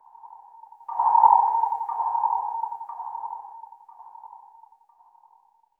a weak swing with

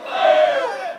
crowd saying what; speaking

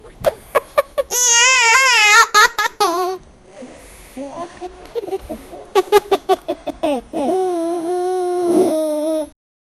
sounds of kids laughing

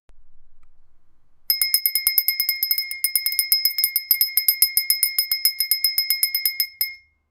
singing glass; musical

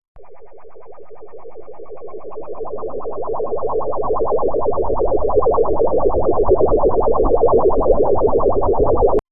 hover button sound sci fi

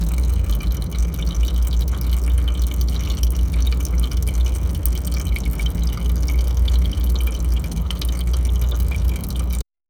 in a boat cabin with

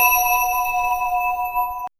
dark fantasy small reward; arcane

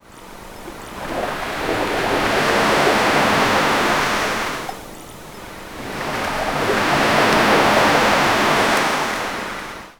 beach

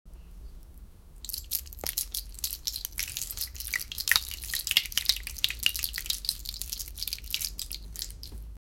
frying, food

